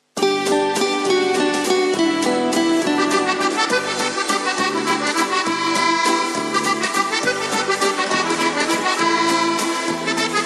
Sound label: musette